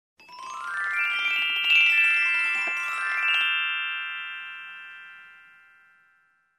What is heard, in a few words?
Glockenspiel glissando up and down